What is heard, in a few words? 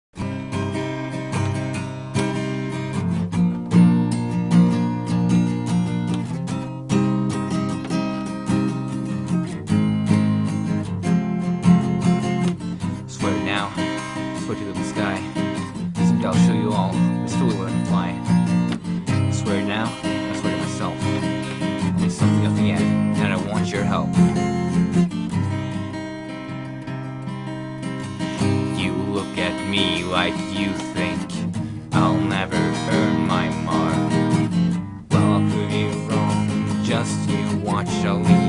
(Acoustic Cover); Pony Music Archive